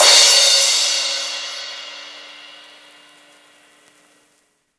huge crash cymbal single hit D key, Royality free crash sound sample tuned to the D note.; Royality free crash sound sample tuned to the D note. Loudest frequency: 497